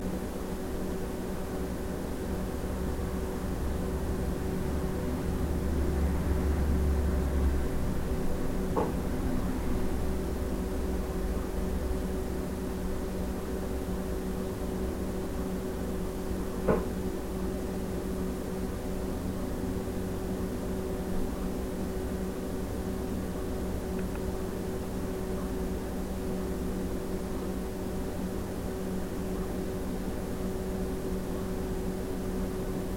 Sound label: knock